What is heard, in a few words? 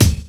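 drum chop; AMG Gota Yashiki Groove Activator; GOTA GROOVES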